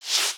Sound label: Text Outgoing; aronwk collection; ndaudio